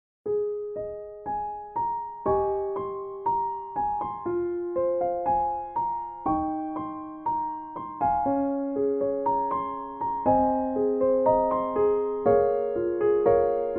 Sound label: Piano